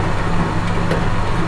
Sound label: motor; Crazy Machines; Играет вся семья